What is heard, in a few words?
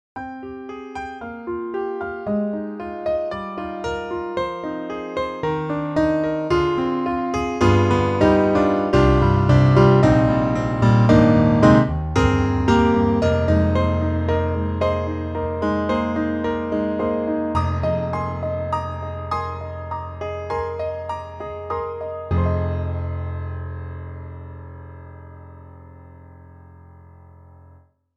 Rock Studio Grand; Cinesamples; CinePiano